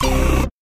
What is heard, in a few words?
wrong answer